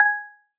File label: countdown tick; revanced youtube